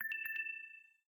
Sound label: Low Battery; LG; Optimus LTE3